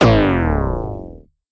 big laser fire; duck game

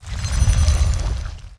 wisp entangle mine; Warcraft III Frozen Throne

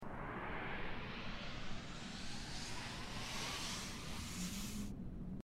focus on surrounding draw; Life is Strange 2; gamesoundopedia

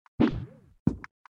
jump male; Subway Surfers

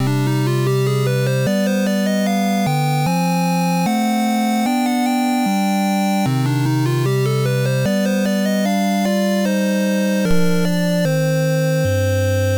spain music; 8 eyes

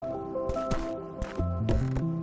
celeste sound walking snow and wood

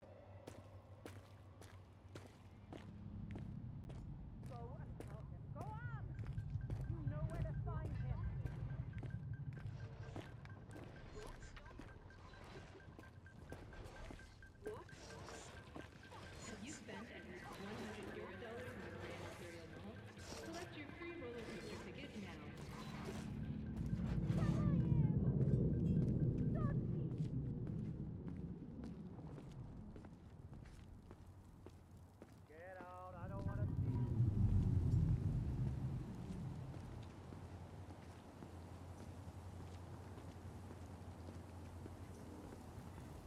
audio sample walking through pacifica; Cyberpunk 2077

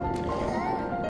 underwater deep breath; ori and the blind forest

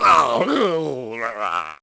ooze; Where is my Water

